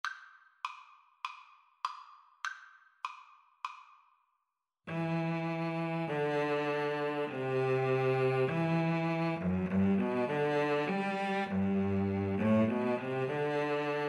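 johnny has gone for a soldier violin violoncello duet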